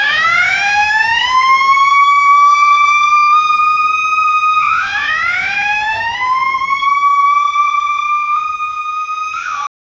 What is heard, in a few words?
Indian ambulance siren